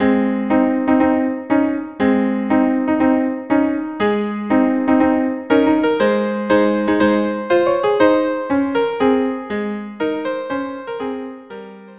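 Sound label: violin duo; aida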